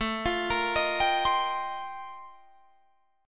F 11th A, chord

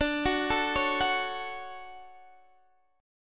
D-Major 7th, D

